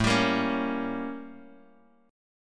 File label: A 7th Flat 9th A, Bass Standard; A7b9 strummed; JGuitar